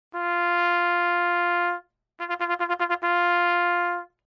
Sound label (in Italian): Doppio Staccato